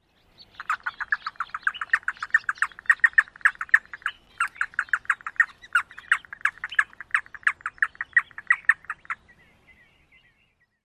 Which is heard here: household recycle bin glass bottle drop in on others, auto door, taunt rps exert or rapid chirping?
rapid chirping